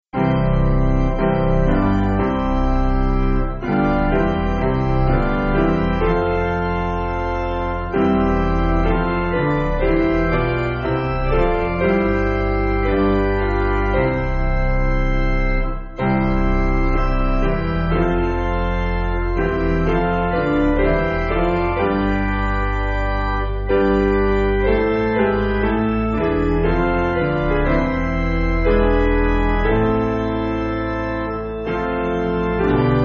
Piano, Backing Files for Churches; Basic Piano & Organ (CM)

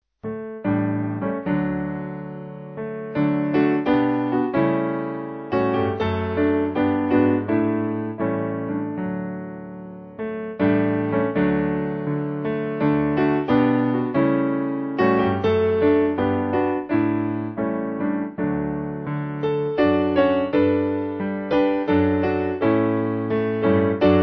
Piano

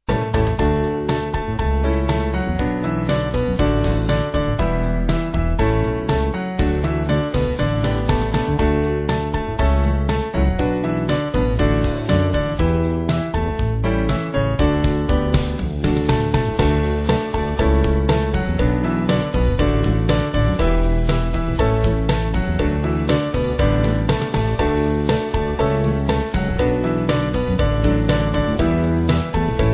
Band

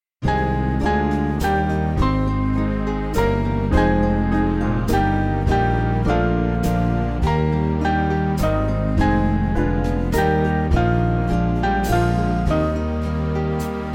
Band

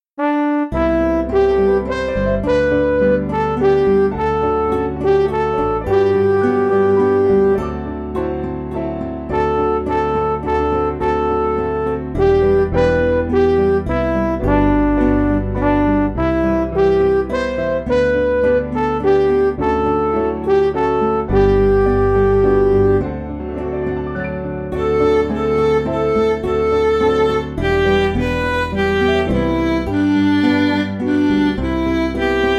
Whiter Than Snow, Refrain, midi; Fischer

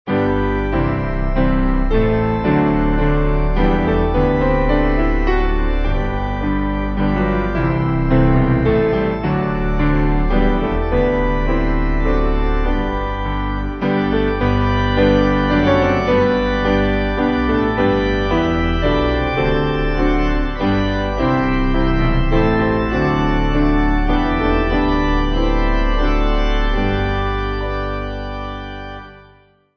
Piano Organ; Immortal Invisible

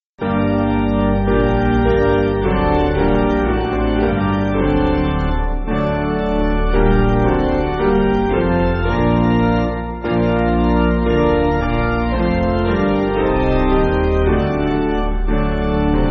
Piano, Backing Files for Churches; Basic Piano & Organ (CM)

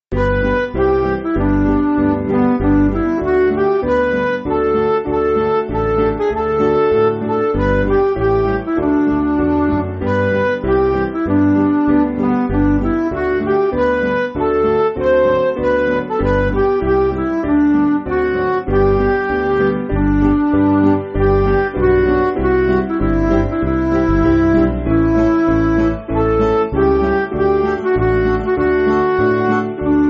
Midi

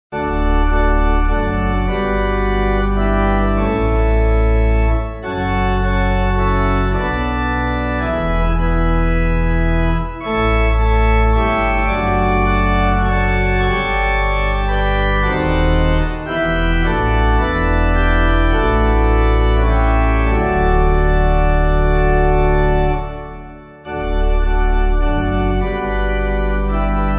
Pipe; Nova Vita

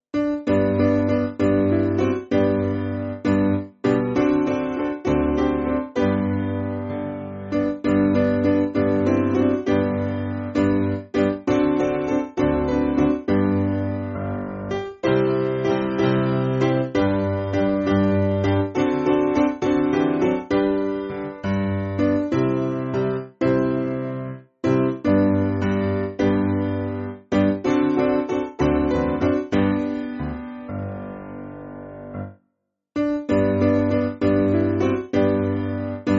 Piano, church music; Redeemed How I Love

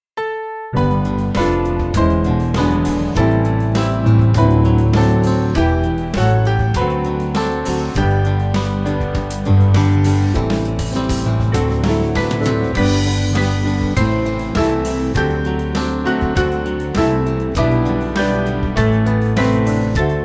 Come Holy Ghost Thine, Band

